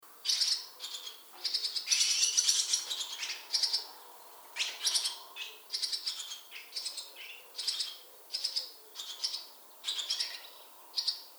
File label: Barn Swallow Sound